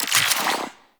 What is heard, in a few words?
Infuse Radiation UI; Warframe; Warframes; Alchemist